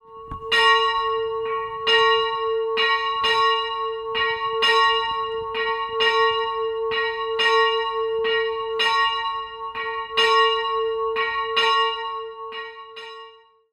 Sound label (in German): Glocke